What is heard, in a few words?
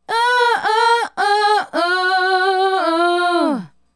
Female Group, Hip-Hop and RnB Vocals